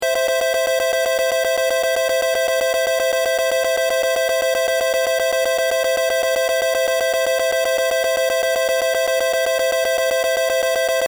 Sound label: win incrementing